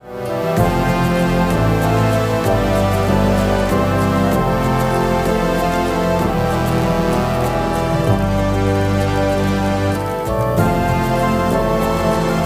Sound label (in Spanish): Epico